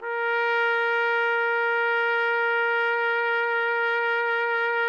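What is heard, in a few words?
TPT, trumpet; Roland - LA Composer vol. 3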